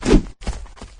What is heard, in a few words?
stand pistol; flood; elite